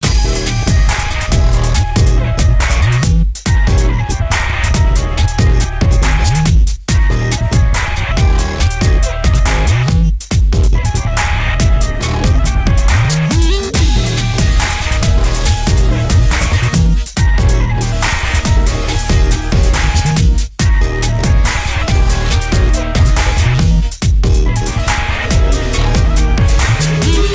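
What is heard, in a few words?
Arabian Electronic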